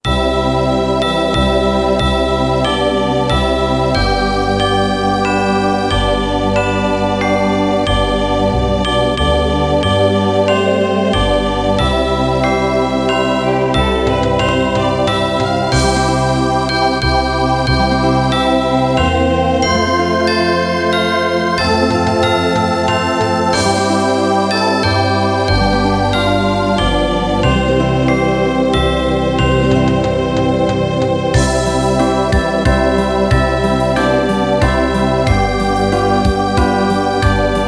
kinderlieder suesser die glocken nie klingen playback

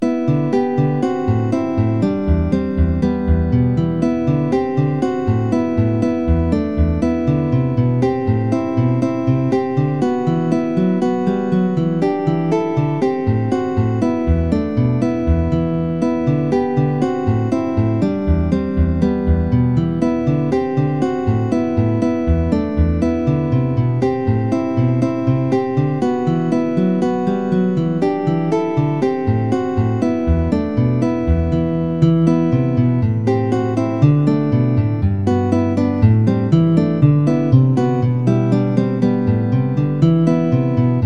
gitarren impression

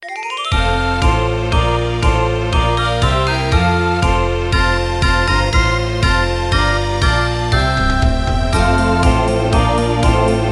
kinderlieder morgen kinder wirds was geben playback

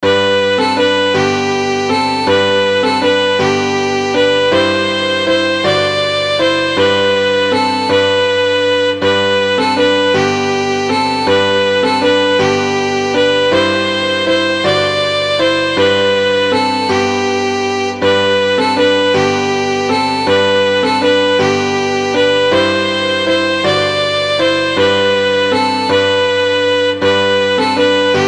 pastorale klavier mit obligater melodie

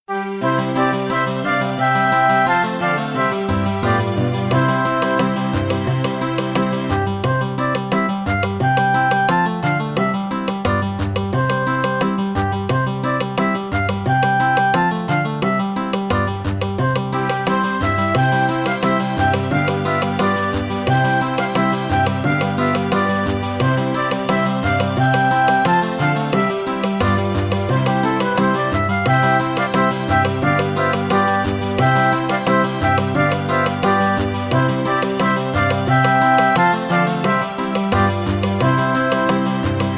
kinderlieder ein maennlein steht im walde playback

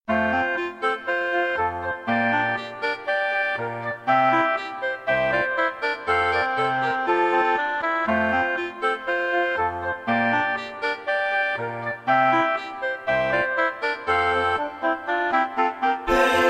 kinderlieder haensel und gretel

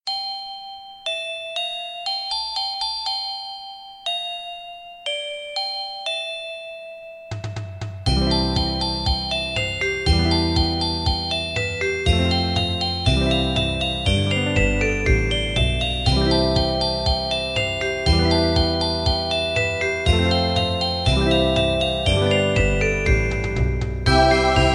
kinderlieder kling gloeckchen playback

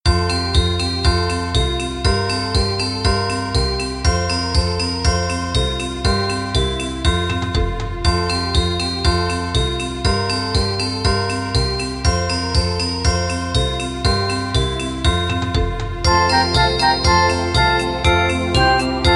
kinderlieder froehliche weihnacht ueberall playback

